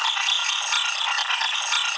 alarm, loops; cc0 sfx loops

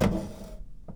Close Drawer, toolbox rummaging

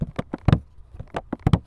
Muted Rolling; BB Retail Therapy Sample Pack

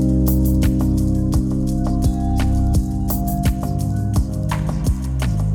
Wistful, Pads; BB 2HTC Samples Vol 4

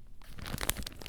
Crumple Thick Plastic; BB Retail Therapy Sample Pack

